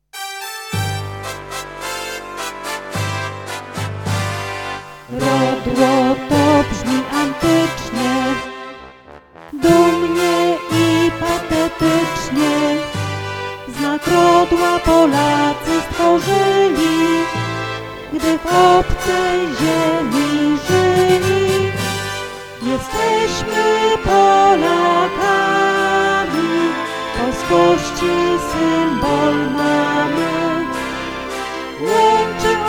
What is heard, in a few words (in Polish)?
Hymn z wokalem